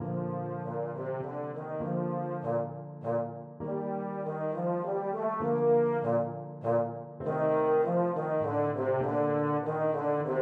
euphonium

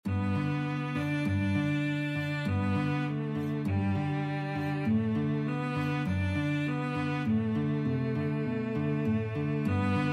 largo, cello guitar, guitar cello duet; dvorak

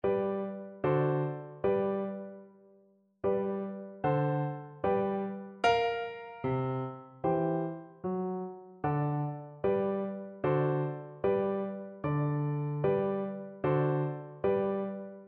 hot cross buns FL kar, Flute

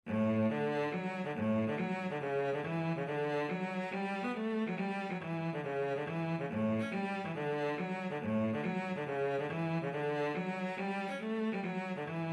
hornpipe, cello; larry lynch

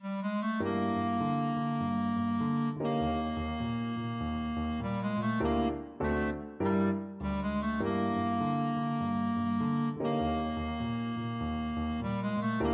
minor mooch, clarinet

